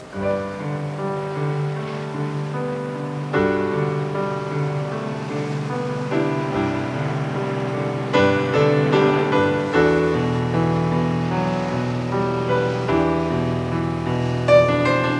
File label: Piano Solo